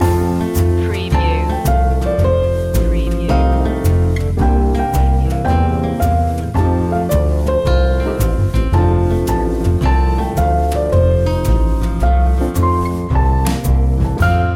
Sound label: go tell it on a mountain jazz